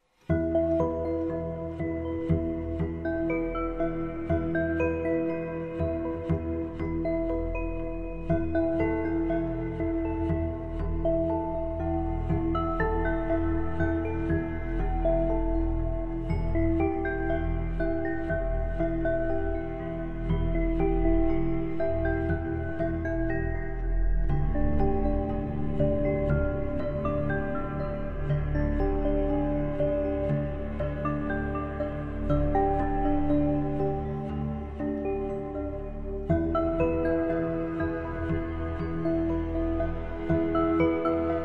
Plasma Oscillation

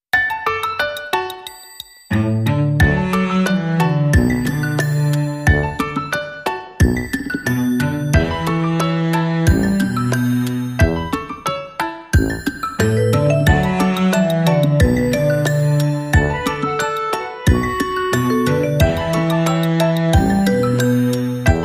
Kids Room Wall Clock

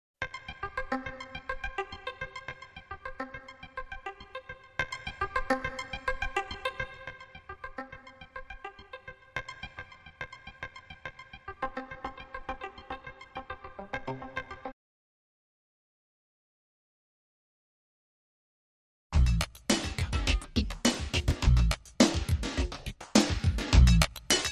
Rhythm

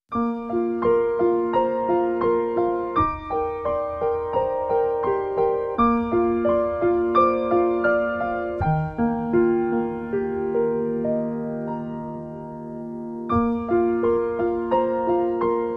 Piano Solo

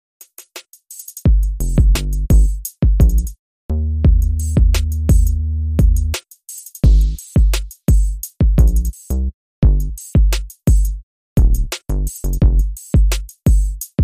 Bass and Drums

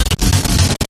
Hard Sputter

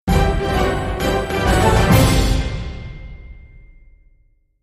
End Sting

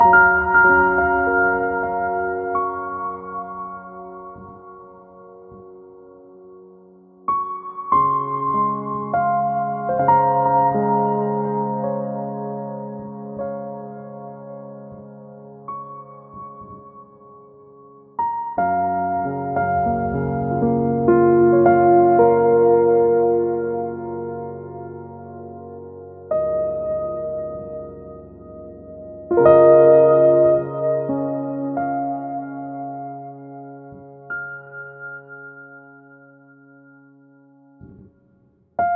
Ethereal Piano

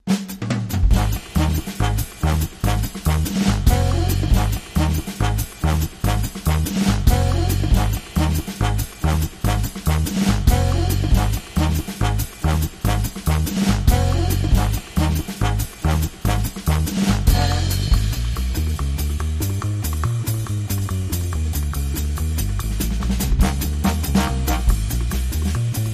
Fat Trombone Underscore

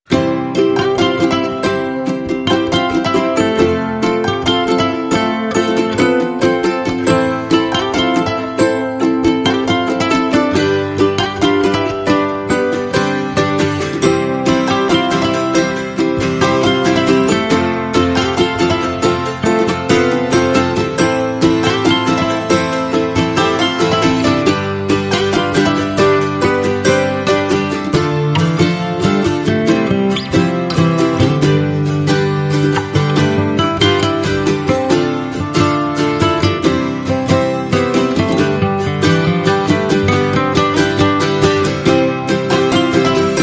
Ukulele Mix with Theme

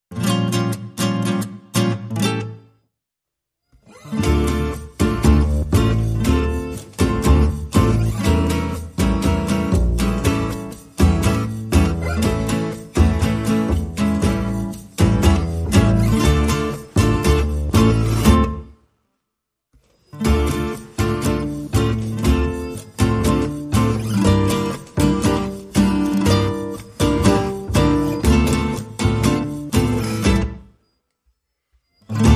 Guitars and Bass

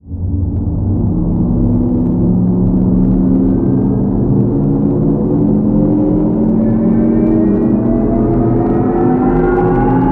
Rise Long; Rise Long Slide to Destruction

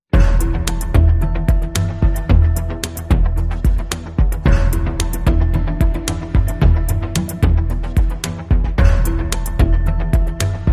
No Ebow Guitar

